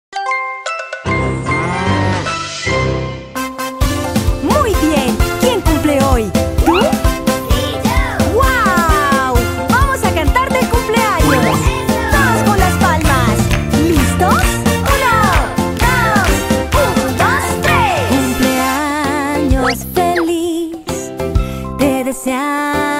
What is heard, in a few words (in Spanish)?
CUMPLEAÑOS FELIZ, Canciones infantiles